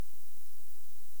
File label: Full Spectrum Rhinolophus hipposideros, bats